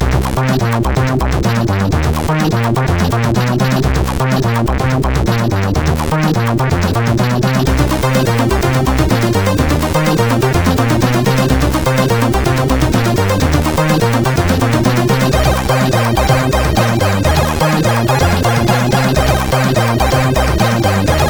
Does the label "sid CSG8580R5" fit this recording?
Yes